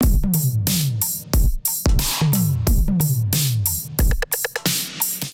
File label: Expo Kit groove 90 bpm; MIDI Clips; Drive and Glow; Ableton; Factory Packs